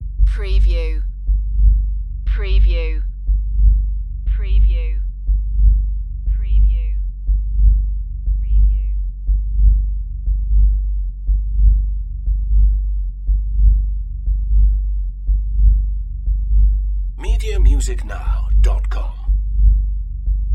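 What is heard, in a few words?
Hells Heart Beat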